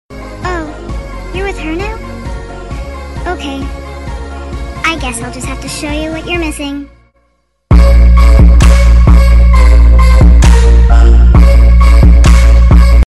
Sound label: end game bass drop